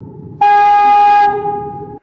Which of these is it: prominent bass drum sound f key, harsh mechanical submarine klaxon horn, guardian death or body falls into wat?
harsh mechanical submarine klaxon horn